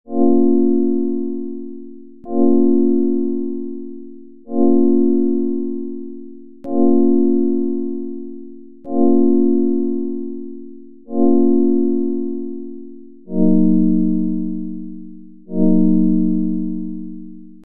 Keys; PatchFactory

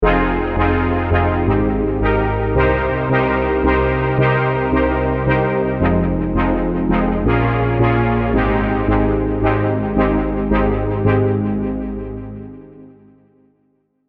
Bass; PatchFactory

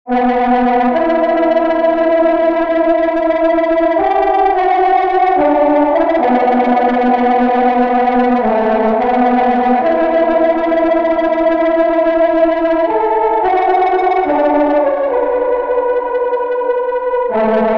Drone; Syntience; Multiplanetary

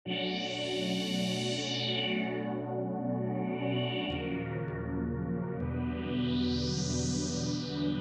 Pad; Tennesseen; Jazz Every Day

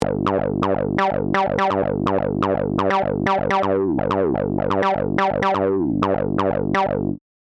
Lead; PatchFactory

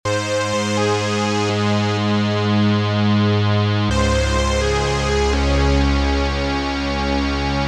Lead; PatchFactory

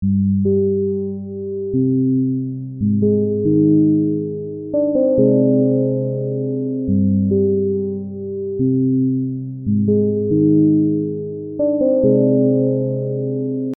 Organ; Channel Deq

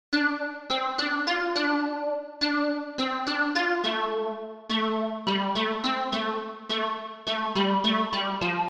Lead; PatchFactory

